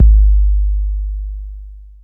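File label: BD, bass drum; X-Static Goldmine 1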